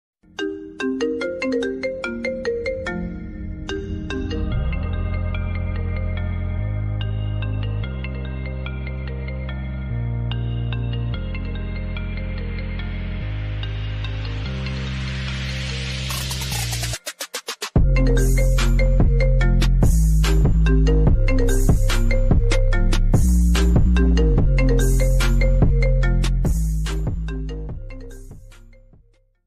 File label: iphone 13 pro max original ringtone